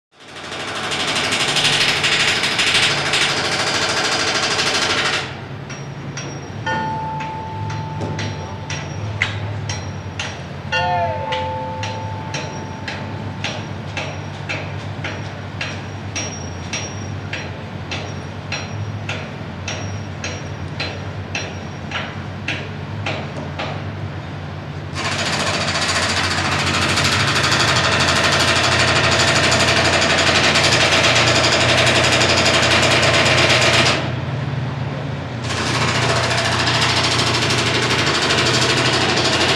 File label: Construction Site Atmosphere; Construction Site Atmosphere. Mid Shot Rivet Gun With Banging And Traffic Background.